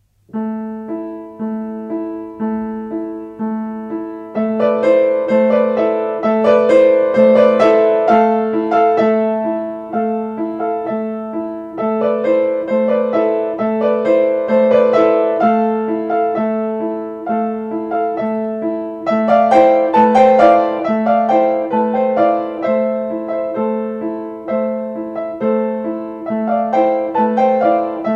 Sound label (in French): DANSE TRISTE